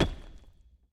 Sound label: block ground; pyramid